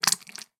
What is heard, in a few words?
Jelly, Movement, Gore, Liquid, Splat, Impact, Slime, Various; The Borax Experiment; Mattia Cellotto